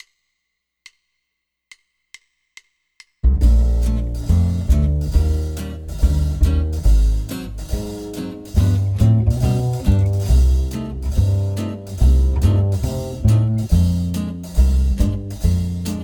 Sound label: PIANO Play Along SWING